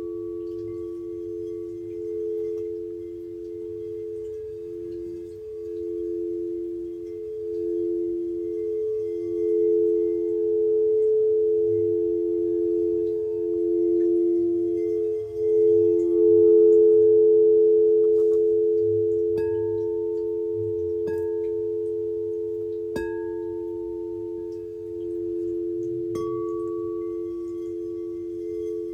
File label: Sleepy Singing Bowls